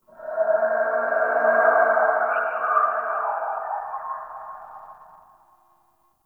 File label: Signature Call; Ambience; Corrupted Ode